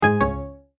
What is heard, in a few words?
multimedia tone error